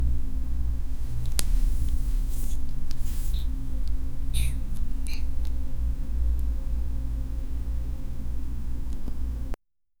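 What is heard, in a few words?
single intimate moist slide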